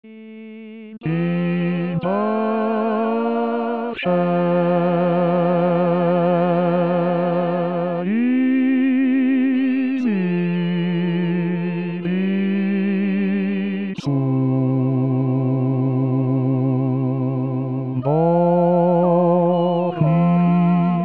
in pace basse; 2023 st jean

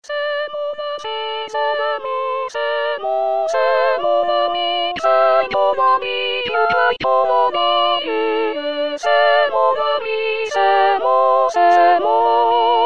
tutti; c'est mon amy; 2016 st jean

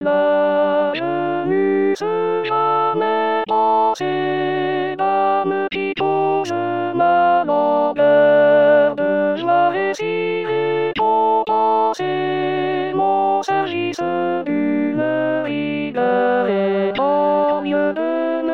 alto

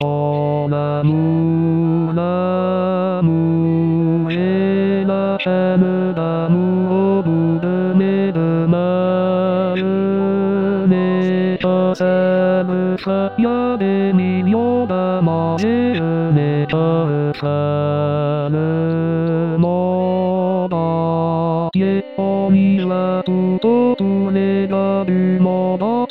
tenor

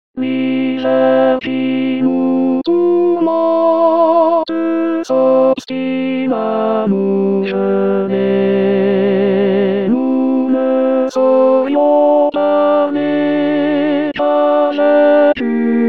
tenor

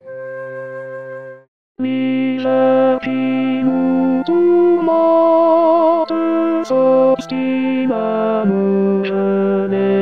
tenor; Choeur de l'hiver

